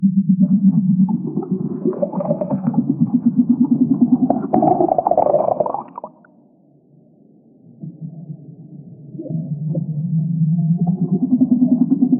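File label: underwater ambience muffled, whale calls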